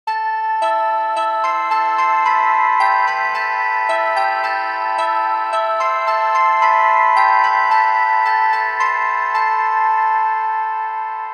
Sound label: carillon hiphop